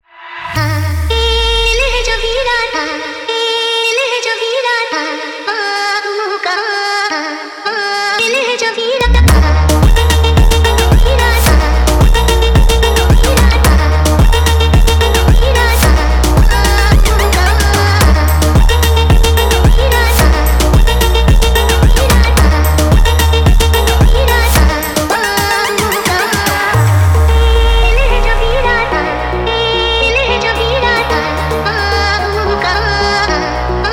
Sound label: Funk sped Up